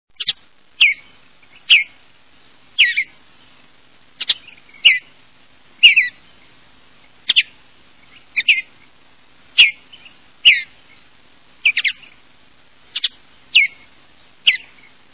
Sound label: Western Bluebird